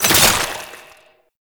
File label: Incarnon Wrist Gun Alt Charge Stop, Weapons; Warframe; Tenno